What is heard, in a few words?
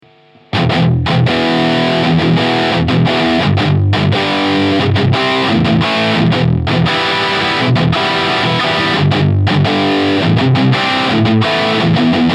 Cali Rectifier